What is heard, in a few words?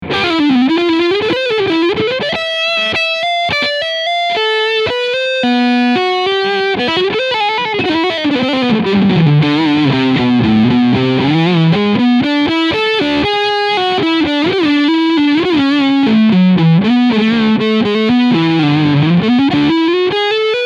Chapman Guitars ML3 Pro Modern Semi Hollow Leadsound Front Pickup